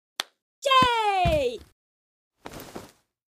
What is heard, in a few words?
jack Win Anim; jack and beanstalk